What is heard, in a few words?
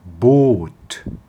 boot